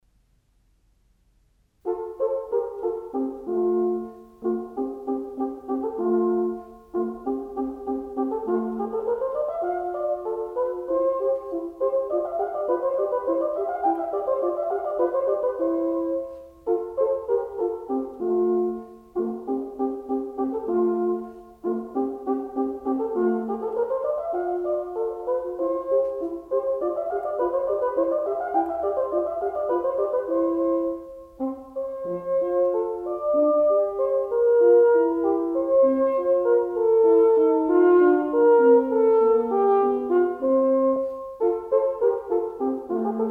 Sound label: Duos - Allegro, Wind; Three (of 12) Duos; Mozart; Serenades and Divertimenti for Wind